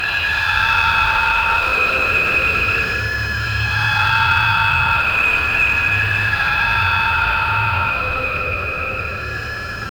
loopable horror ambience; eerie

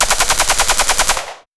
assault rifle shooting

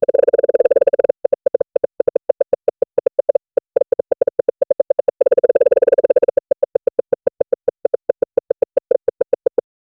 distinct comedic

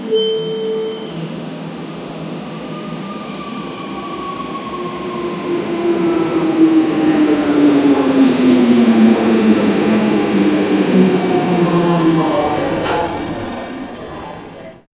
in a subway stopped at, subway

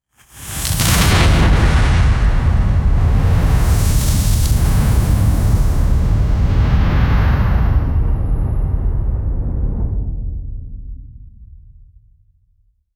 fire energy overload, anime

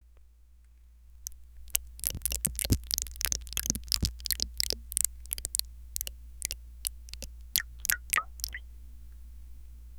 realistic asmr, cosmetics

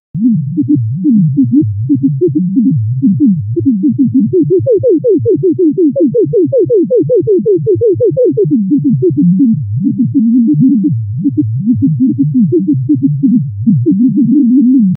broken computer alien, alien

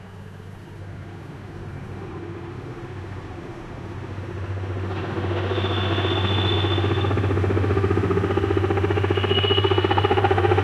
a helicopter chasing a, busy street

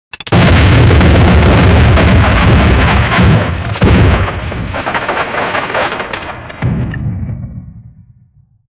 an 8 second long tank, military

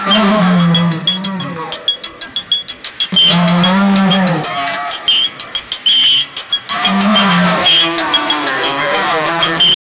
redcap goblin sounds

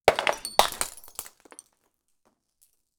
low pitched muffled crash, ceramics

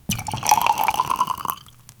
gentle liquid pouring int, bottle

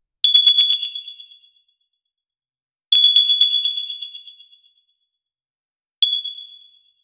small notification sound; sound effect

